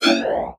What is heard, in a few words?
Multimedia Internet CD-Rom Flash Wipe